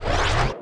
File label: Missile Hit